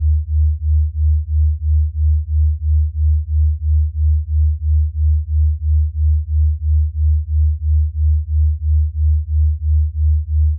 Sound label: 75 78Hz mono